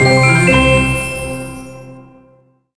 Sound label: Win; Bratz Ponyz 2; NDS